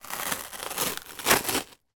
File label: cardboard rip